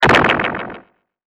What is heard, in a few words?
Ojama; Puyo Puyo Puzzle Pop; iOS, macOS, mobile